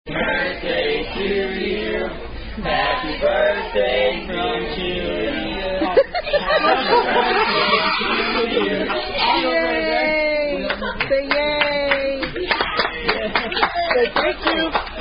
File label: Happy Birthday (Standard) Sung By Wait Staff; Chili's; 6th Birthday Dinner